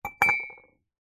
household cup mug set down on concrete ring resonate; zapsplat